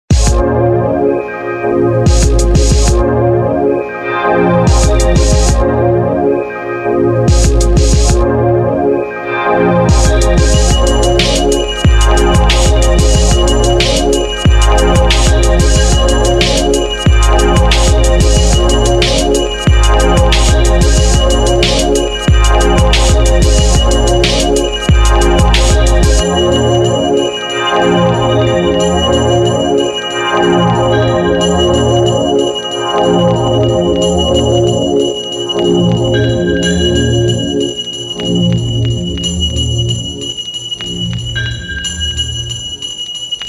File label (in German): Glocken Und So, 92BPM